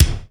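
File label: BD, drums; East West; Global Sounds; SPD11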